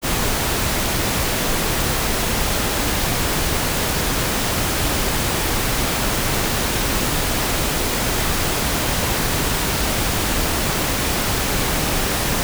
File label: Correlated Pink Noise